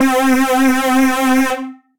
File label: Metro Lead, Synth Lead